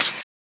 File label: Open Hat; FLPRO